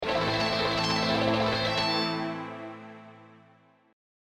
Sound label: Sweeping